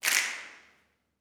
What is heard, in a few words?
SNAPS, Gospel